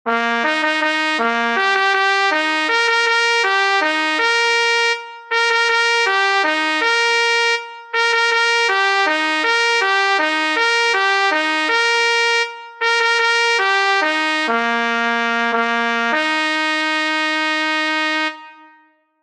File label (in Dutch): halifax, Trommelkorps; Chirojongens Wij Koersel